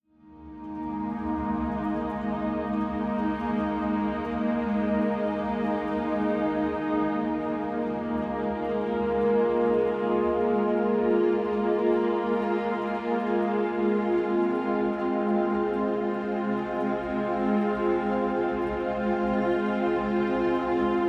Music for Relaxing Cats Calm

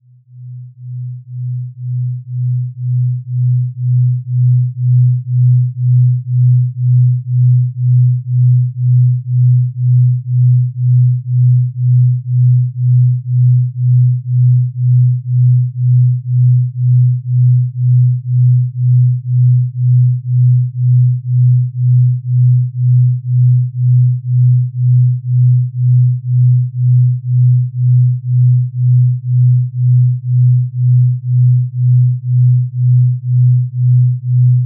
Delta Waves Binaural Beats; Tmsoft s White Noise Sleep Sounds

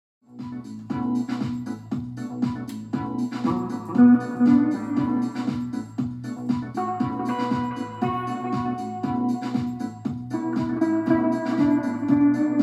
Instrumental

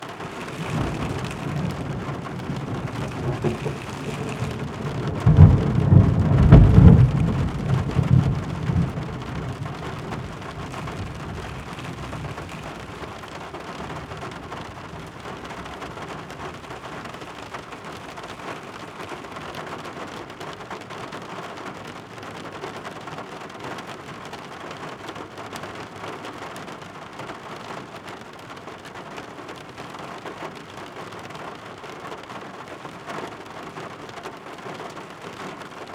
Binaural Beats Brain Waves Isochronic Tones

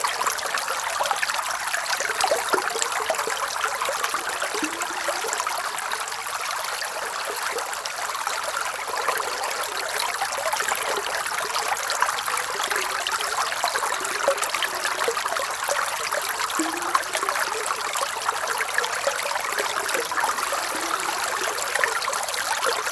Stream Close Fast Ripple; White Noise Babies; Sons da Natureza; Relax; Regen

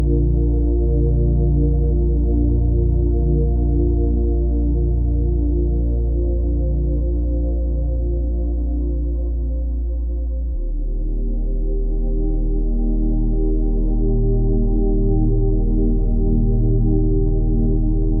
Zvuki prirody

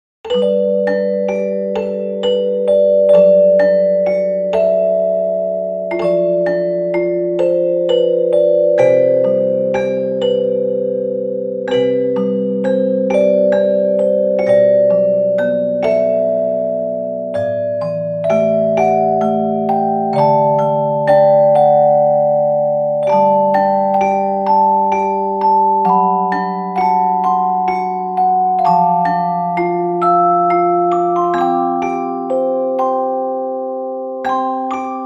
Orgel Lullaby

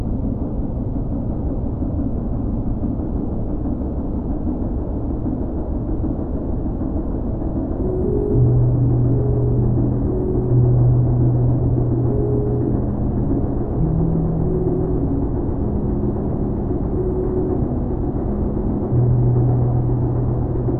White Noise

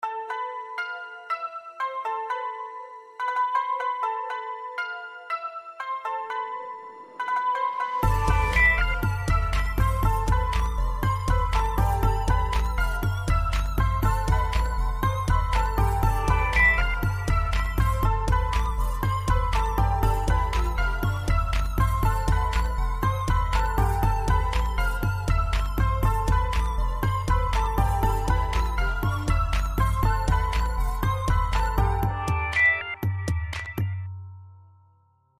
Hip-Hop

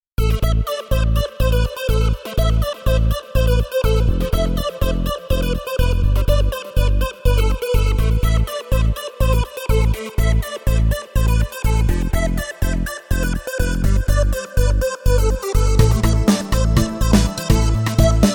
Music Ambiance

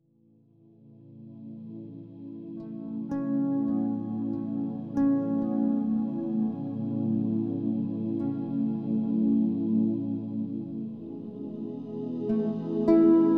Celtic Massage, Irish Folk Instrumental

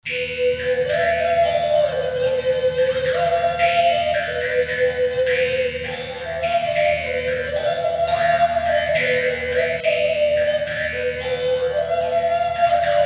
ethno industrial

